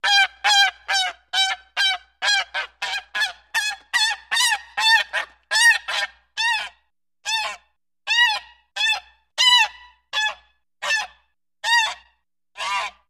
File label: Goose Honks Various, goose